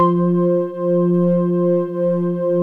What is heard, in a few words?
3x cheese, Korg M-1; Rubber Chicken Software Sample Bank